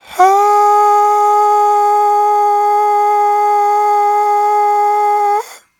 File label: AHHH, Vocal; Polyend